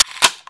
m4 m203 out

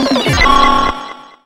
time up

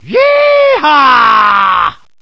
yeehaw

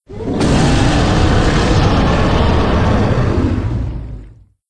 gar alert; sven; npcs; cthulhu

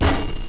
player ladder

